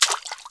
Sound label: Footsteps Wet; Lara; Playable Tutorial Level